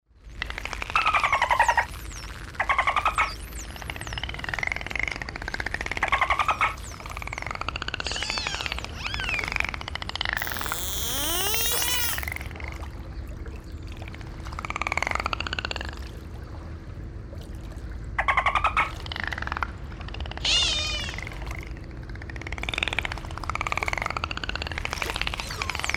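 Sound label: dolphins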